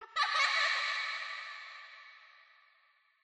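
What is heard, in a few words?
Scare reverb; The Joy of Creation Halloween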